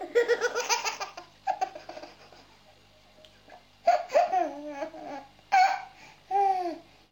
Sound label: Baby Funny Laugh